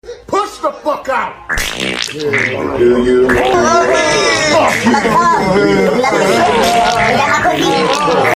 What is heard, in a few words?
pain